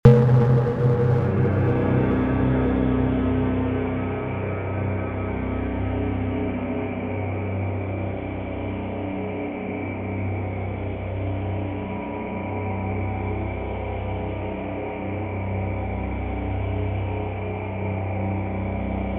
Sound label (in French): Sonnerie Relax soir et bang